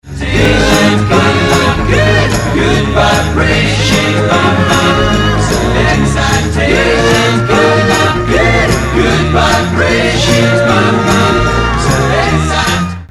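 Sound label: old famous melody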